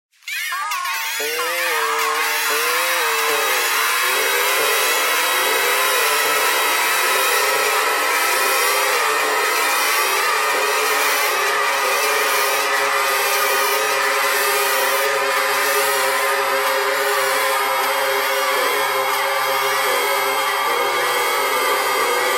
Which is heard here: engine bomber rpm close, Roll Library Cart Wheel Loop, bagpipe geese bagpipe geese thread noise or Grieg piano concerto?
bagpipe geese bagpipe geese thread noise